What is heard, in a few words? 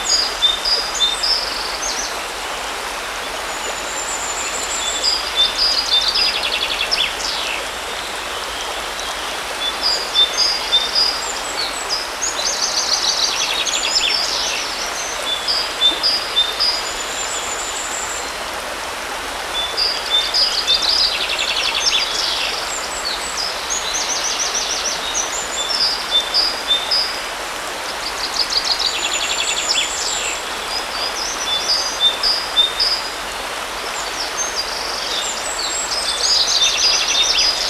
Sounds of Nature Relaxation Pink Noise Organic Nature Sounds, River Birds